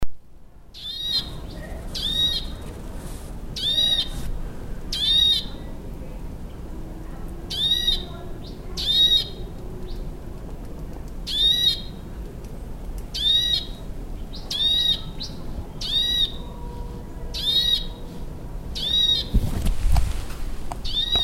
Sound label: nuthatch chestnut-vented Sitta nagaensis